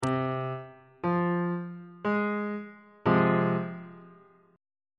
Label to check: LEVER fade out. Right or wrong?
Wrong